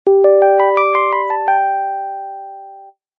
Minipiano; NIU N109 Niutek

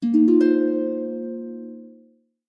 Harp, notifications; Infinix; XOS 16

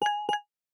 biometric register progressing, LG Rollable; Rollable LM R910N; ui

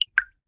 Drop, notifications

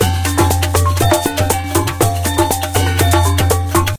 Jungle Jam, ringtones; Huawei; Mediapad X1

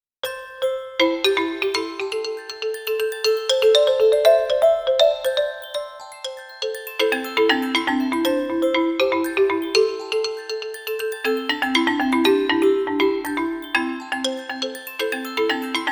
resound, ringtones

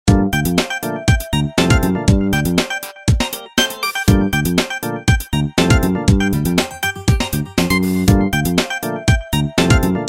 Shuffle, ringtones; Vodafone Smart Mini

